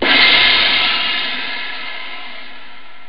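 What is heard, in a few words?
REAL CYMBAL; jazz jackrabbit 1; M8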